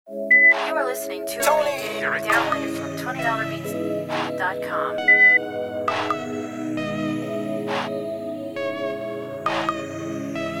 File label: Trap beats